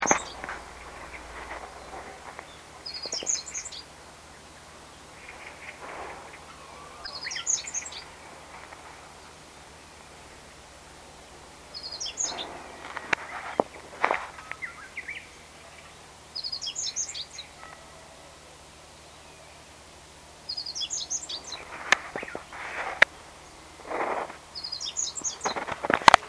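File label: meadow bunting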